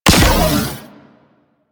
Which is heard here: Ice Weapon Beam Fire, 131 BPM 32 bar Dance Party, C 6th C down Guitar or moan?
Ice Weapon Beam Fire